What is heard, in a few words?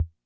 Kick, rhythm330; classic machines